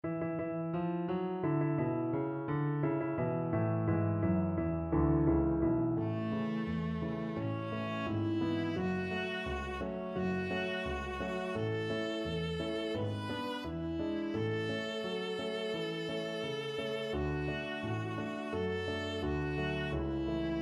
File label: anchors aweigh, viola